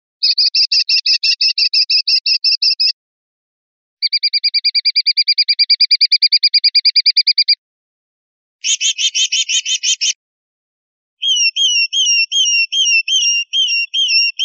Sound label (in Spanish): gavilanadas, pardillo